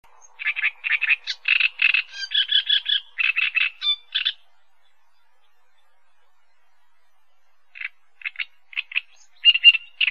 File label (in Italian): cannareccione